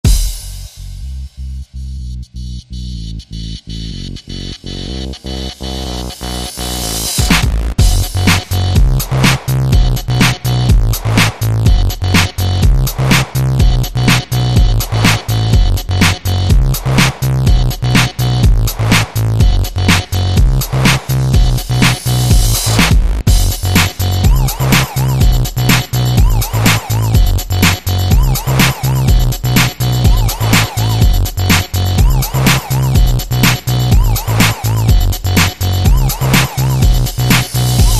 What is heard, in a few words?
Crush Groove